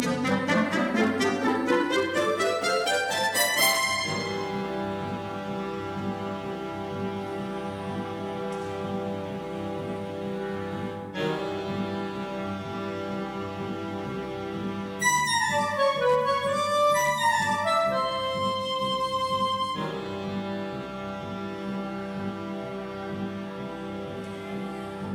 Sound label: accompaniment